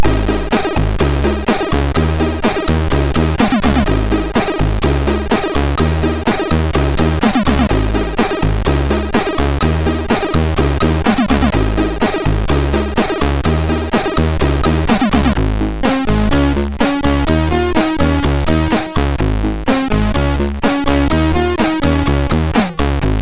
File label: Music Sample, sid MOS6581R3